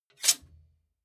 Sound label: sword scrape fast